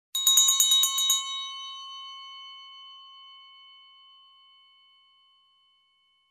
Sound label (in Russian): Немножко потрясли колокольчик